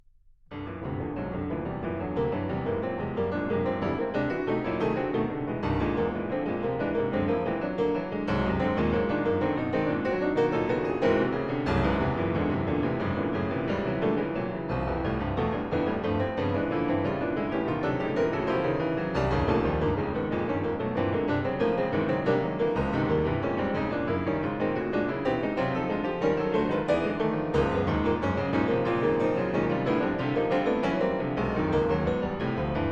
etudes pour piano; columna infinita

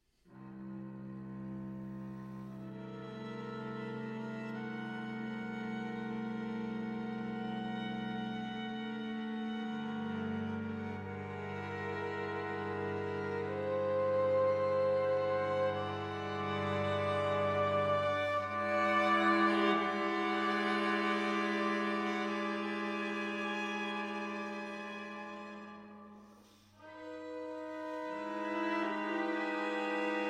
string quartet; Armenian Music Project; Armenian music new and rediscovered

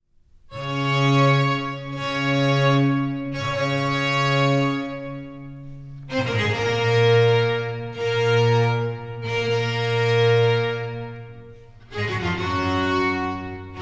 concerto for cello in G major, largo

